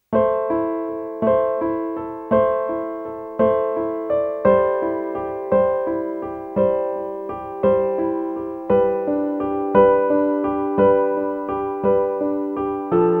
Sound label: solo piano